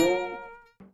Metal Damage; Bad Piggies

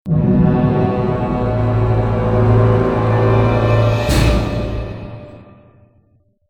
Fail; Sniper Elite Berlin 1945

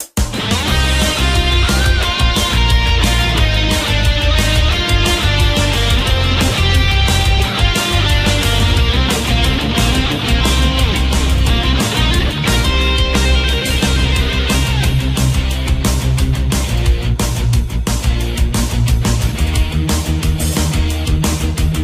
Instrumental; Friday Night Funkin' vs. Kiryu OST PC mod gamerip Windows gamerip 2021

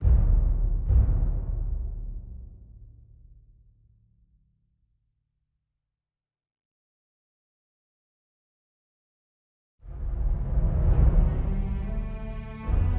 World Music

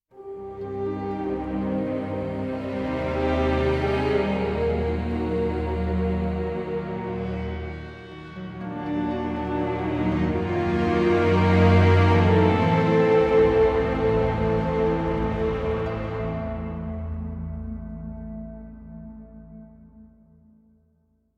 Music Lose; Chaos Reborn

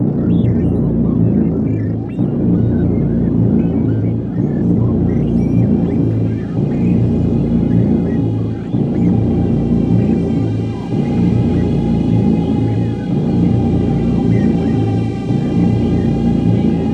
Robot Attacks; soma gamerip 2015

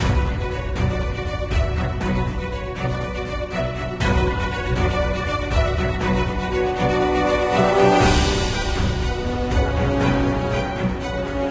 Music Battle; Brave Fighter Demon Revenge; Android

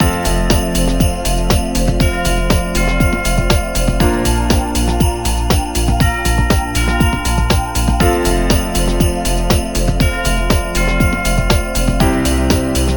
music xmas

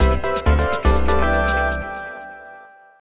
Home Menu Banner; Picross e collection 2011-2018

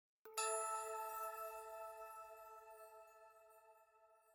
item Jingle Chime Only A

